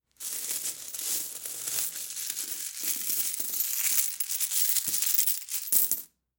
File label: Coins Pick Up